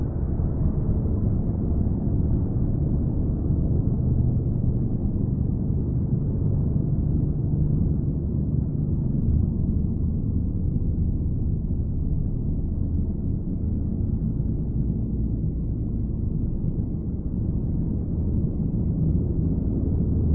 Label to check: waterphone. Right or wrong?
Wrong